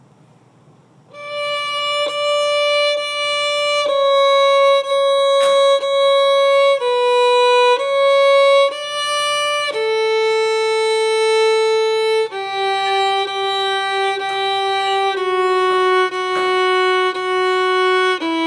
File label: French Folk Song